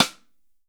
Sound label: Rim Sidestick Snare